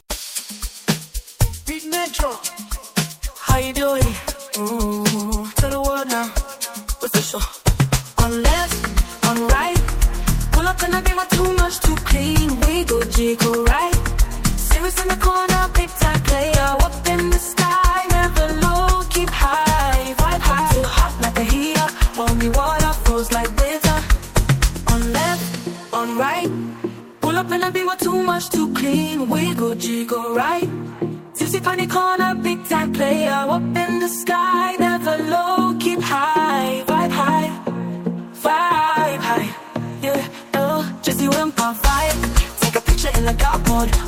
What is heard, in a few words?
Amapiano